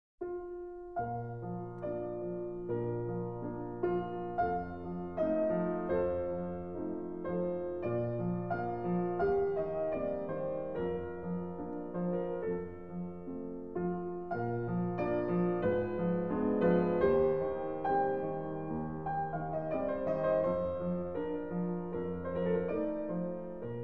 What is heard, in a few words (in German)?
Andante, Klavier; Moritz von Schwind